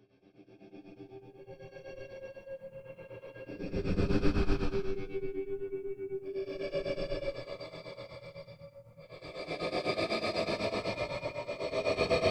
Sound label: Alien, aliens breath sound design; aliens breath sound design collection